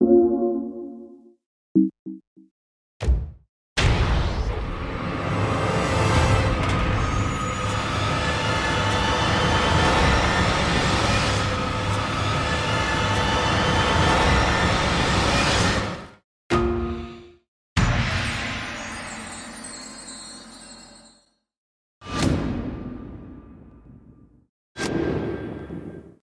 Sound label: Game Sounds Sprite Base; Mahi Gaming; Forbidden Throne